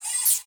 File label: controller open; immibis